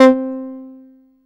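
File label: BASS OBMX, G4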